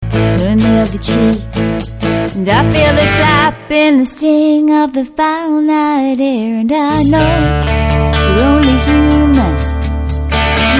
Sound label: Steel Strings